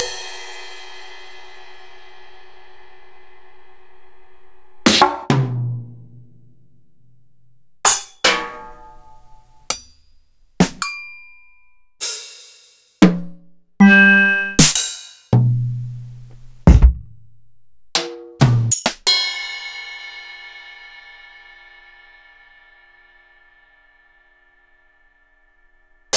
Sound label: EMU PX-7; m8